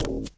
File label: glass, footsteps walk; player; source engine; left 4 dead 2; boomer